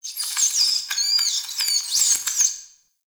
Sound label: Town Street Critter, Ambience; Warframe; Duviri